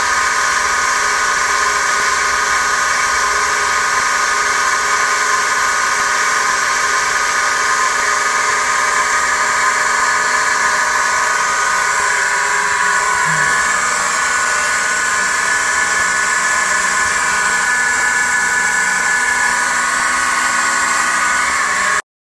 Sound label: Pump Noise; plumbing